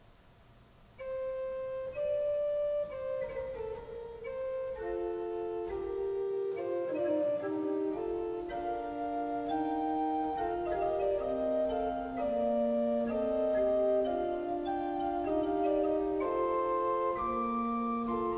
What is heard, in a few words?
Fugue, Organ; Magnificat quinti toni; Johann Pachelbel; Complete Organ Works